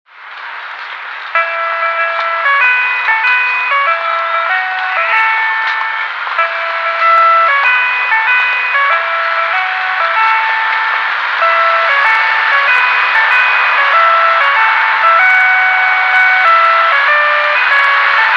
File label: retro